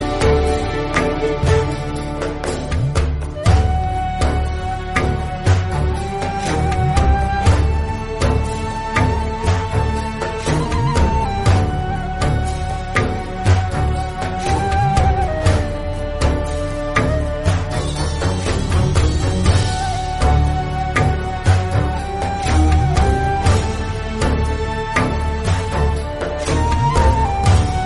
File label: music bgm instrumental